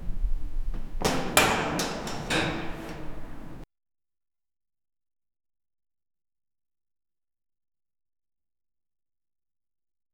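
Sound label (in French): bruits de cave souterraine, underground; bruits de cave souterainn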